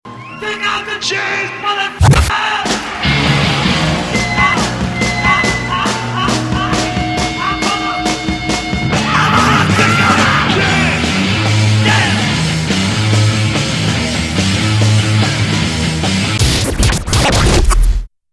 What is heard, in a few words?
SONG OPEN